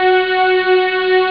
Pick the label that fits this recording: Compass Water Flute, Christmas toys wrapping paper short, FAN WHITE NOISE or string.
string